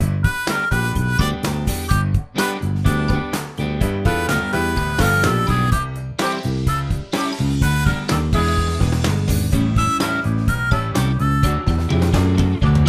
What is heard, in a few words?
productive catching